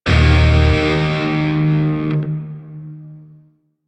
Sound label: Shawzin Min Chord E; Grineer; Warframe